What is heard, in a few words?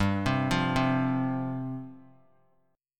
G 7th Suspended 4th G down; G7sus4 arpeggiated